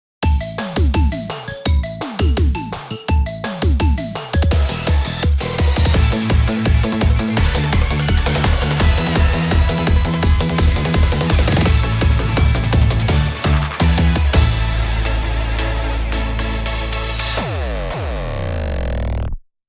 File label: ED, Instrumental